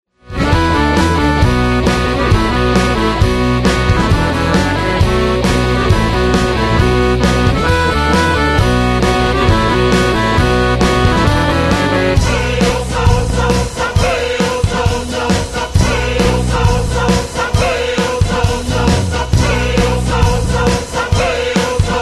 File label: contemporary polish village music